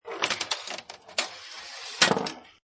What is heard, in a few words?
Pinball Sound Ball Rolls On Rail And Falls Off End; Video Game Sound Effects; Lynne Music